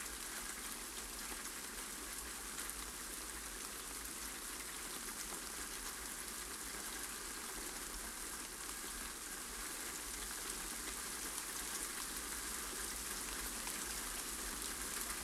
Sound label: Spring Rain Loop; don t be afraid the first toy